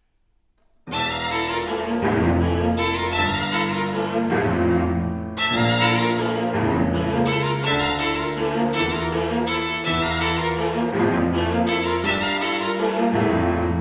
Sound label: string quartet; classical